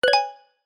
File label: confirm